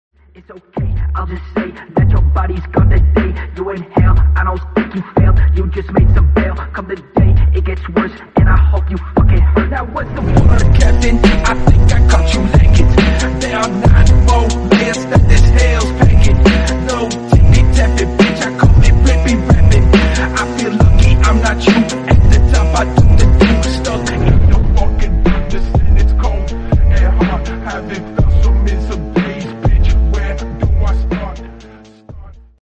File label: phonk remix